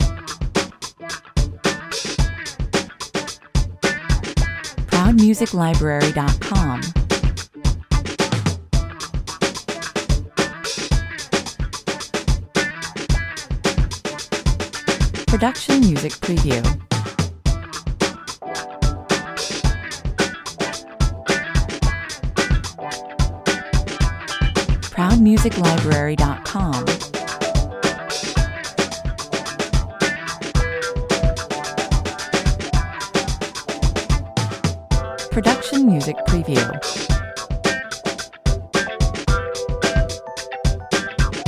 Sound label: funky 110 bpm